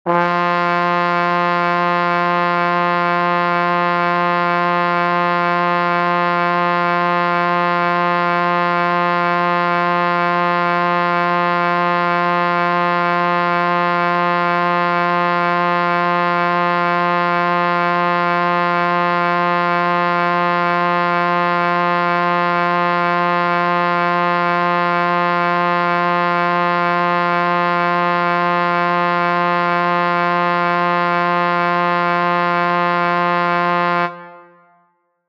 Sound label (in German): 800Hz Trompete in B; Klänge; Ton